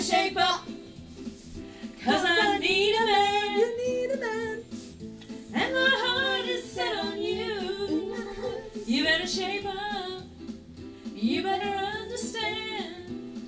mommy karaoke

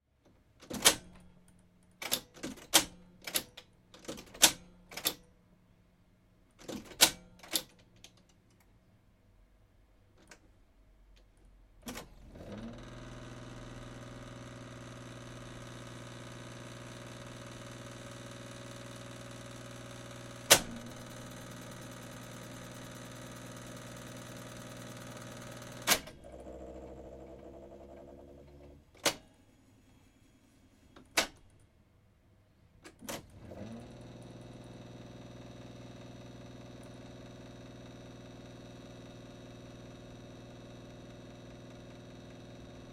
Sony TC377 transport mechanism tape machine

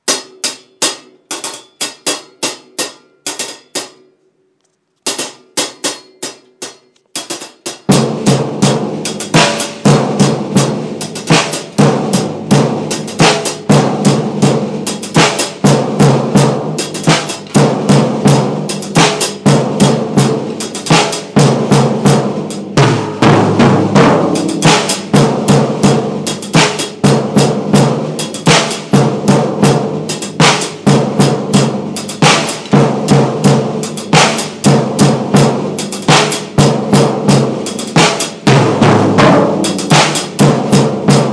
drum beat

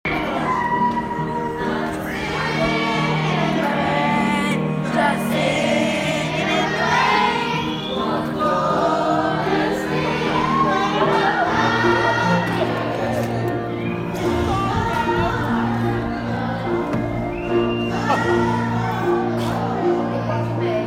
school choir

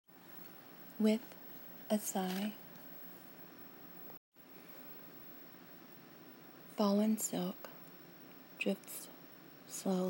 with a sigh

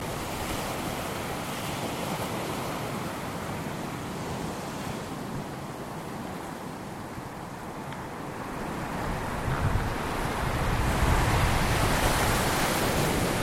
waves crashing at logans beach

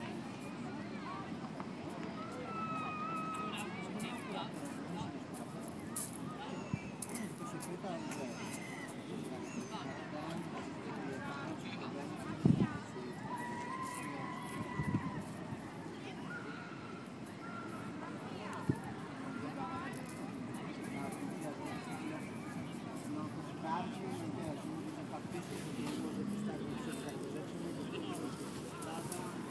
seaside sounds at southend

